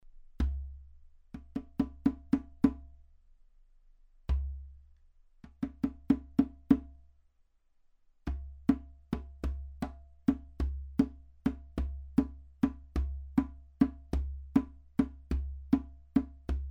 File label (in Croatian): bubanj